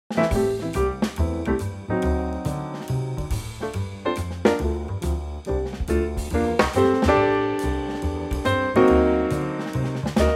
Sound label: F Trio